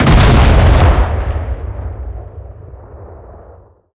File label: cannon 130mm b13 shot, tanks; cannons; War Thunder